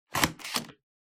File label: Hole Punch Punch